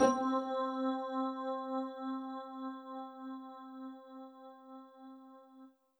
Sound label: EP Pizzicato, yamaha psr e373; Venus EP+Pizzicato